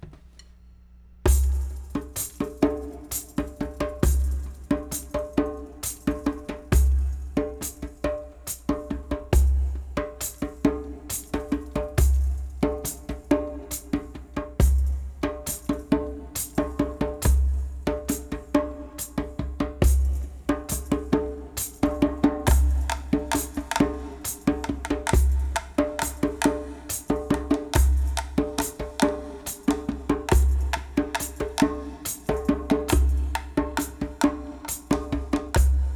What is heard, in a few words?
Shaman Beat